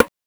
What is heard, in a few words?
Rim; One Shots; Drums; Doggie